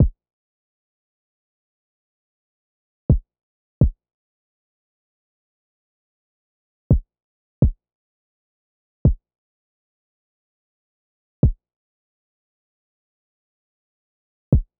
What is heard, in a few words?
Kick